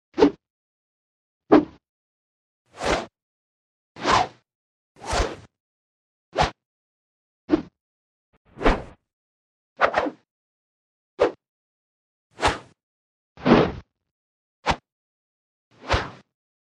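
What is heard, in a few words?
zvuki vzmaha, swing whoosh sounds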